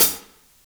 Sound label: hh hit, HAT, Drum Kits; Big Fish Audio - DOM; Redrum